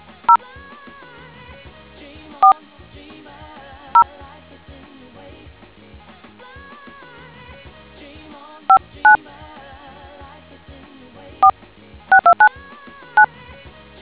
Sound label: dtmf